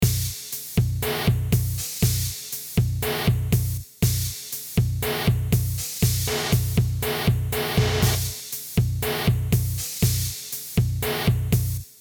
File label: Sinewave